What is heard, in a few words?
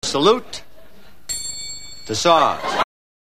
saws